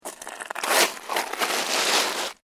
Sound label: SNOW SCRAPE